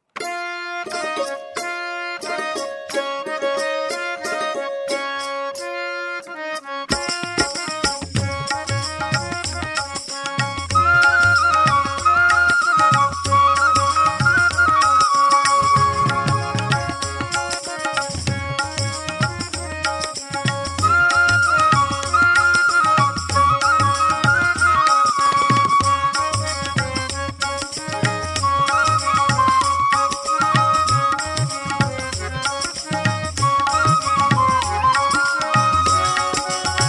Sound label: Radha Radha Radha Krishna Radha Ladies Karaoke C Sharp Pancham